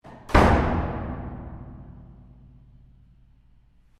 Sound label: Door Heavy Fire Escape Slam Echoe Stereo, Heavy weight door to fire escape; Miscellaneous Doors